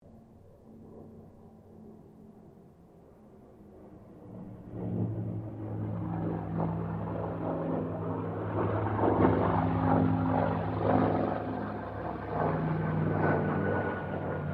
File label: AIRCRAFT TROJAN SQUADRON 4 PASS DISTANT; Mixed aircraft squadrons; Airplanes; Vehicles